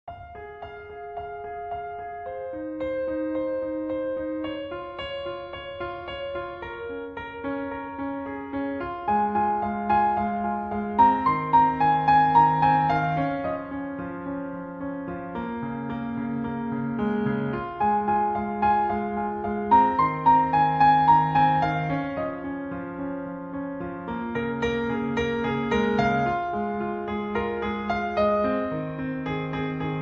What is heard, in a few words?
Piano Cover